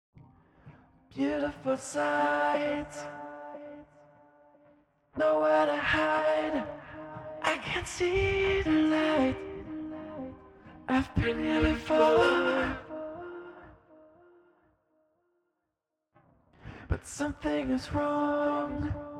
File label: vocals verse1 64 beats